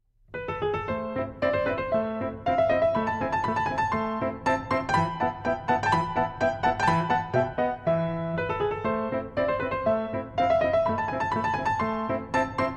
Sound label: Piano Sonata in A Major, Rondo Alla Turca; Piano Sonata No. 11